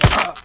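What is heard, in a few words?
bullet hit flesh; csgom; cstrike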